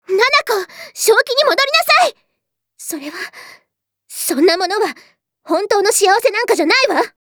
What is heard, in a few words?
lap